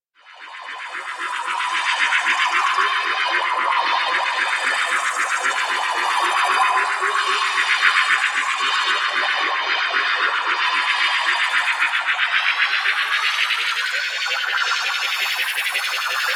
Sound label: Hover; Warframe; Quests; The New War; Lotus Reveal